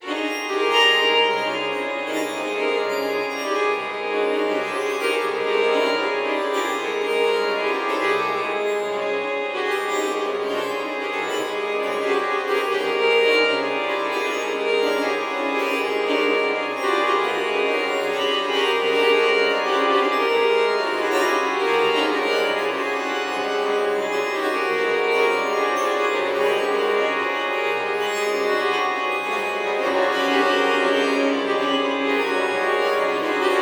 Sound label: Strings; Xenakis